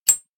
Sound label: grenade pin; blockade classic